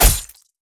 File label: bow hit; archer